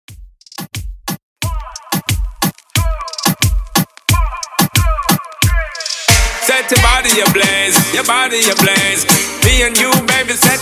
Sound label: Reggae Reggaeton 90 Bpm Clean